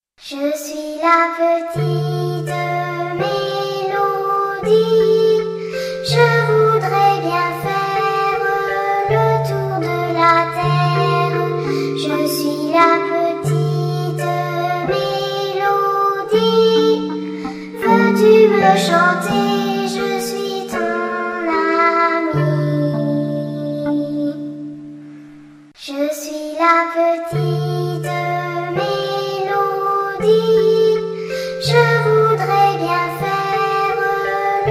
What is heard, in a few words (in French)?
petite melodie spectacle